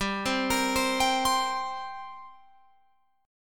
G Minor Double Flat 5th, G, Guitar, Open F; arpeggiated; JGuitar

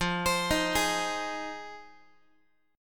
F Minor 7th F Guitar; JGuitar

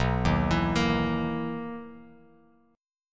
B Major 11th B Bass Standard; BM11; arpeggiated; JGuitar

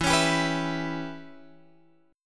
E Minor 6th guitar chord, Drop D

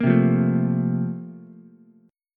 A sharp Major 7th Suspended 2nd, Ukulele; A#M7sus2; strummed; JGuitar

